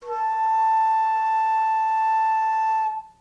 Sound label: A sharp 5 baroque, flute